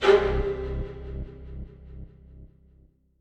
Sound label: mus sting string, Minecraft Story Mode soundtrack; A Portal To Mystery